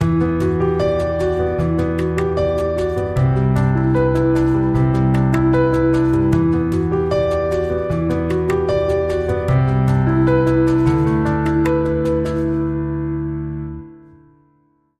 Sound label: Piano